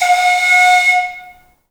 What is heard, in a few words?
AF FLUTES, Heart of Africa; Spectrasonics; Heart of Africa 1